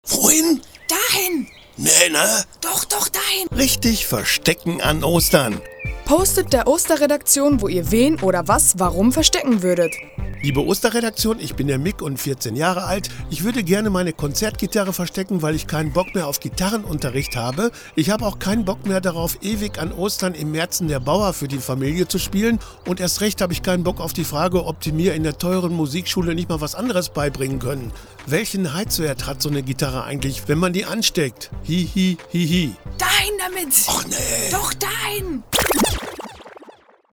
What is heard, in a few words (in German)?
Gitarre